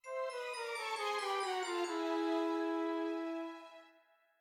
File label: music sting ballout; My Little Pony